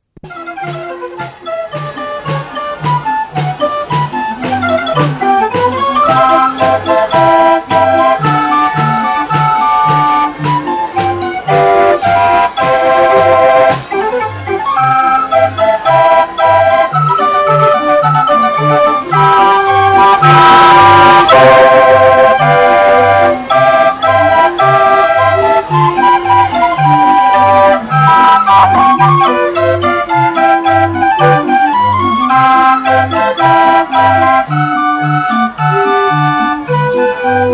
Fairground Music, Steam driven mechanical organ; fairground rides and carousels; Tom Hutchings

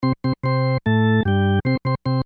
Sports Hockey Organ Melody Lets Go; Hockey (Sound Effects:Sports); SFX Bible 6

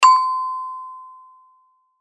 Bell Elevator Ring, Bell Elevator Ring Tone Chime; Elevators; Machines; Blastwave; Foley

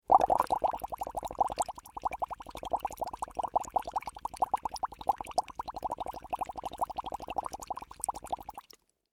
Water Bubbles Straw Blow; Nature

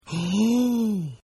Gasp

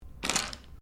Dice Mixed Laminate Table Pickup

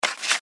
Office Envelope Manila Pick Up; Paper; Offices

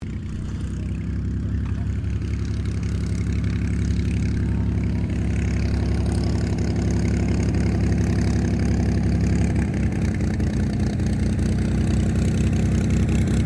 AIRCRAFT PL-12 AIRTRUCK TAXI; 1965; Airplanes; Vehicles

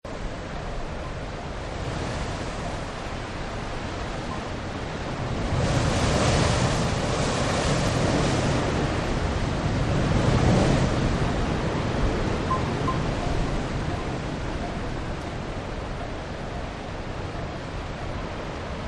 Chimes Heavy Wind; Windchimes; Musical; Partners In Rhyme

